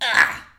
Voices argh

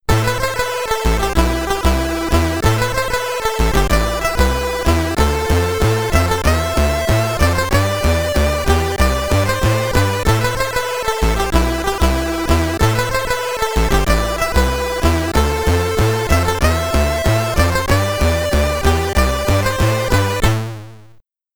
Winner (SID Stereo), commodore 64; starball

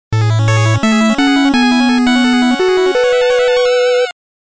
Level Intro (8-Bit)

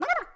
Poke Sync; talking tom cat 2 android ios online gamerip 2011

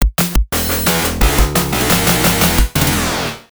Victory; Robot Battler; Genesis

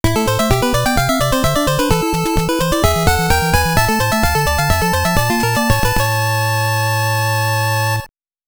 All Clear; Castlevania III: Dracula's Curse

